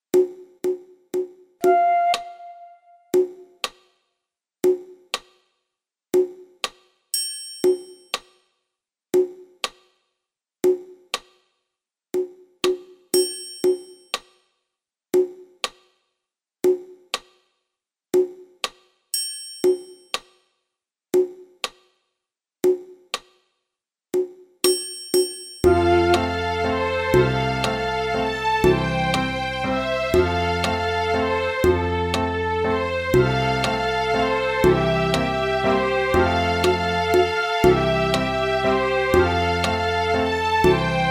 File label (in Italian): base senza melodia